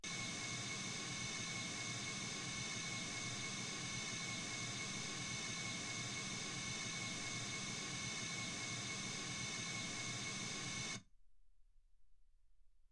mls 48kHz

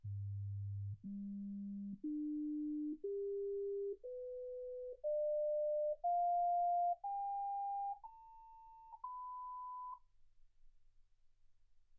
sinus tones 48kHz

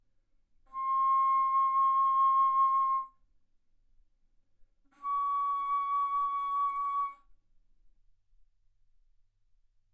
Bass Flute ff C6 Db6

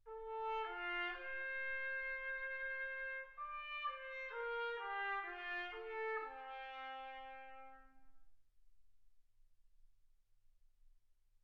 trumpet

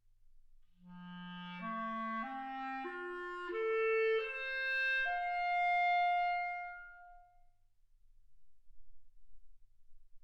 clarinet

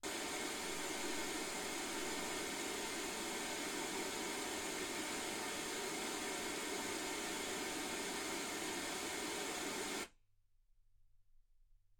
wgn

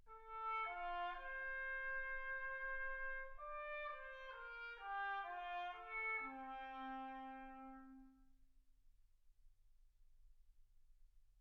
trumpet

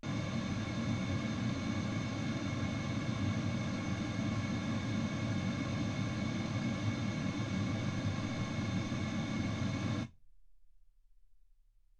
pink noise 48kHz

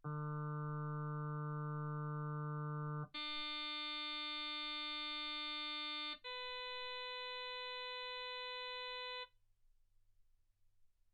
harm sinus 48kHz

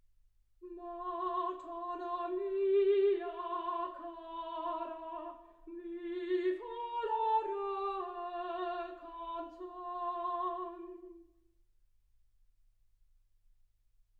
soprano

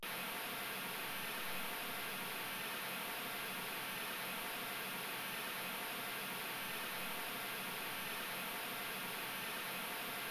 mls16 48kHz

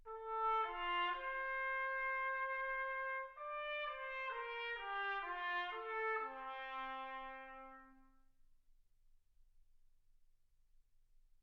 trumpet

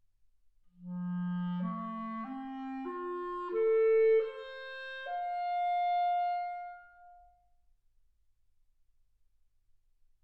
clarinet

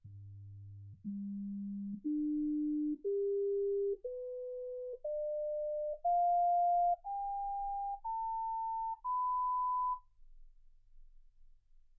sinus tones 48kHz

